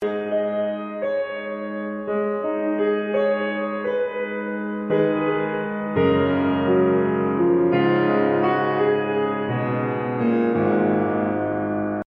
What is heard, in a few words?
Piano